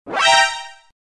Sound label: alert; Snatcher